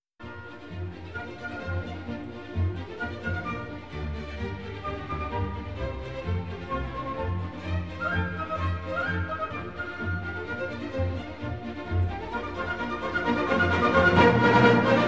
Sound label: Symphony No. 2; fourth major movement (Schubert); Legend of Galactic Heroes; Galactic Empire SIDE